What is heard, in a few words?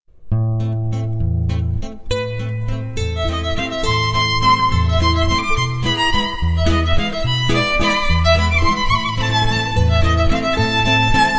argentinian waltz